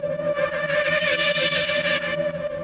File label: sqeals under filter loop